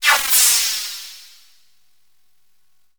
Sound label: D+B 160; eJay